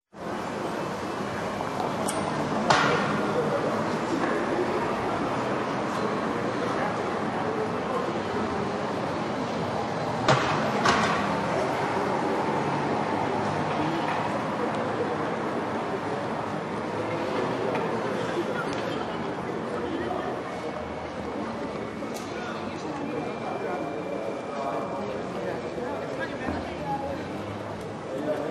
Plaza; Plaza Del Riego; oscardeavila

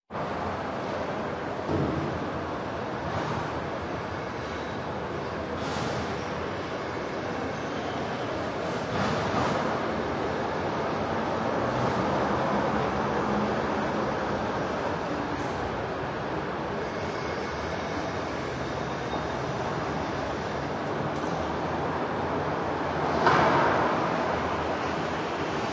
Patio del edificio historico de a universidad de oviedo; oscardeavila

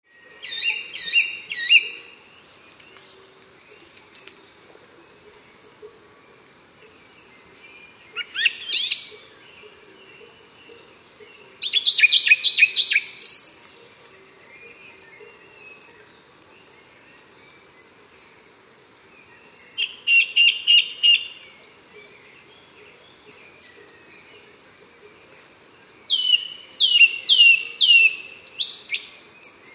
Zorzal hayedo de Pome; CarlosDeHita

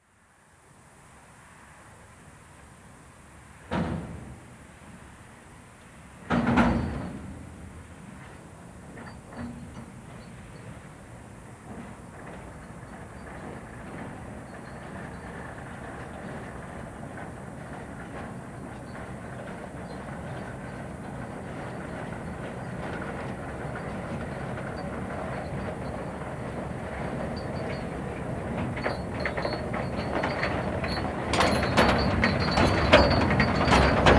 Tren vacio; MindRevolution

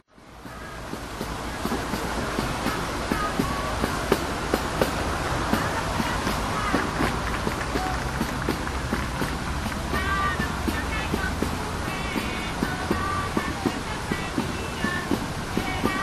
Plaza De La Escandalera; oscardeavila

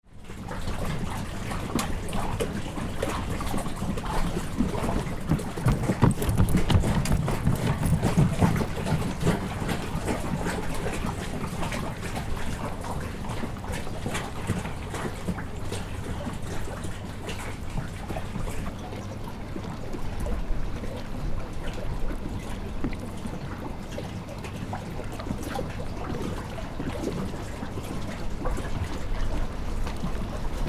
corriendo sobre las aguas

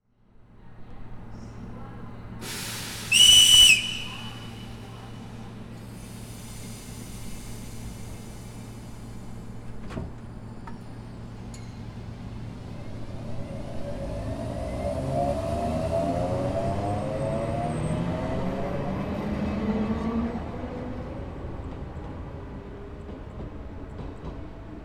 Salida Tren Cercanias, Estacion; Estacion Sanz Crespo; mapaSONORU

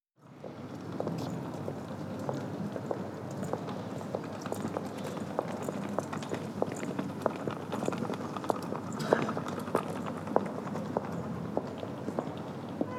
Arrastre de maleta y pasos en la plaza; OscardeAvila

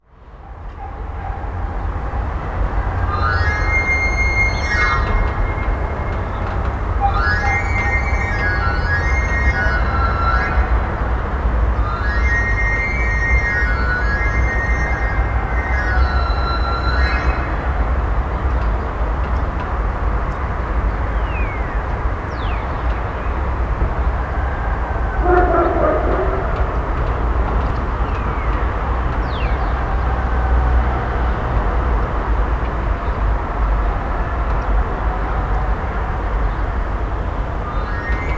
Afilador; La Corredoria; TomasBS

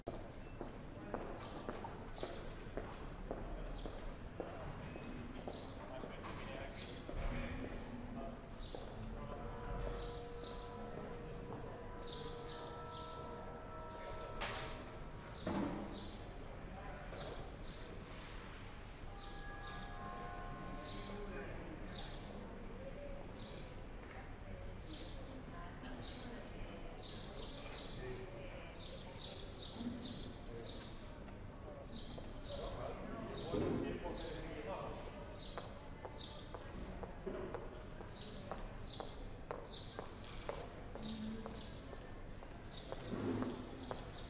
Plaza Del Pescado; oscardeavila

